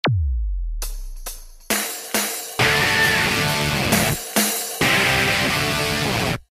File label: riff; hi-fi rush